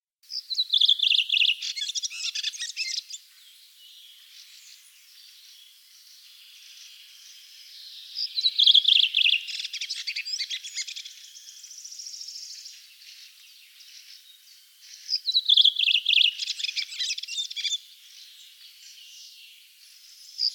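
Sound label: redwing voice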